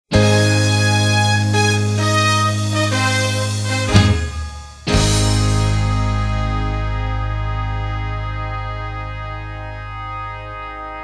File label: Accompaniment